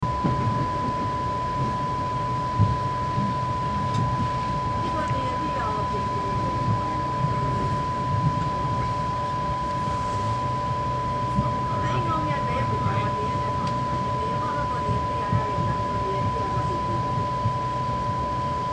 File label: Onboard Train Quiet